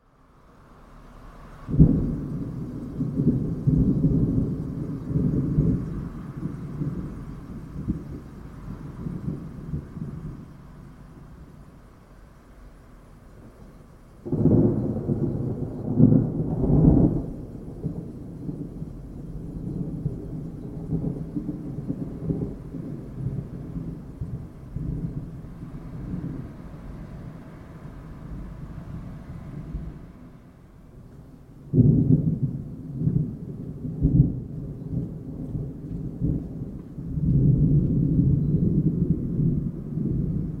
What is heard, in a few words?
thunder